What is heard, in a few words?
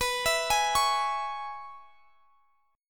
Db Minor B; arpeggiated; Dbm/B